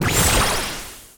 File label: Enemy Silkhat Stick Motion; disney star smash android ios mobile gamerip 2020